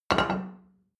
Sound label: plate place table medium; sport-freizeit; restaurant-cafe